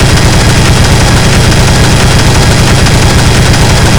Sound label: assault cannon shoot